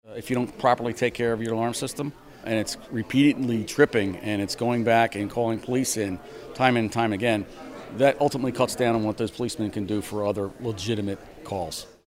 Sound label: ALARMS